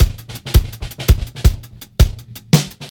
95 bpm breakbeat c key, Free breakbeat sample - kick tuned to the C note